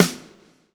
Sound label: lash, backbeat